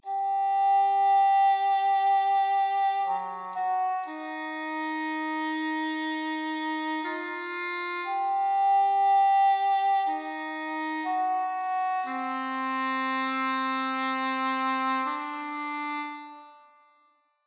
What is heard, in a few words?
Corno; tintin reporter cigars of the pharaoh